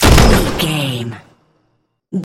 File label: sci-fi weapon rifle large shot